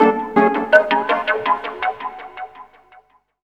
Cryo Spider Synth; Jungle; Blu Mar Ten - Jungle Jungle - 1989 to 1999 Samplepack

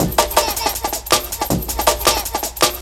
Wheel Up 2 Bad; Jungle; Breaks; Blu Mar Ten - Jungle Jungle - 1989 to 1999 Samplepack

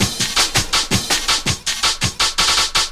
Horn Break; Jungle; Breaks; Blu Mar Ten - Jungle Jungle - 1989 to 1999 Samplepack

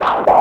Whip - 6A; Jungle; FX; Blu Mar Ten - Jungle Jungle - 1989 to 1999 Samplepack

